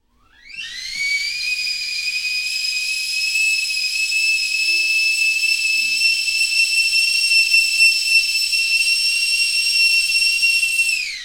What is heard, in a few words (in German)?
pfeifender wasserkessel; geraeusche digital